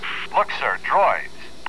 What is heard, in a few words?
pain; stormtrooper